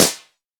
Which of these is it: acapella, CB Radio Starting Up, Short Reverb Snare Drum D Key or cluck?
Short Reverb Snare Drum D Key